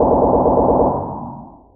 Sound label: weapon bullets; spacetac